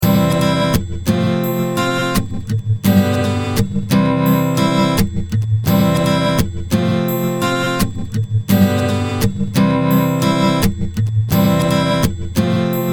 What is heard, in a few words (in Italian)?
filastrocca vocali base